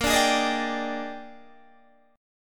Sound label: A sharp 13th, Guitar DADGAD